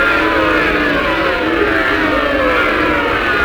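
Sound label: audience voice boo; UFCTapout1; XBOX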